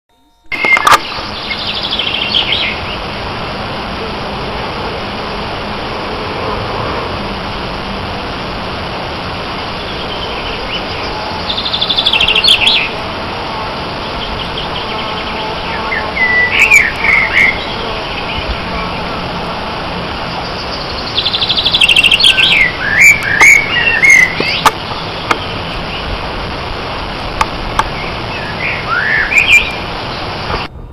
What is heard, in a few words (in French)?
oiseaux